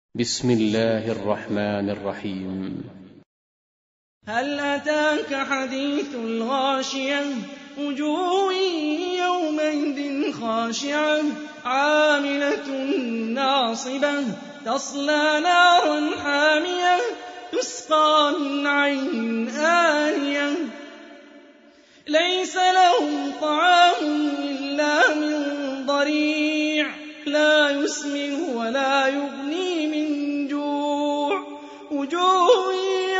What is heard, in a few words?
Quran; Hani Refaey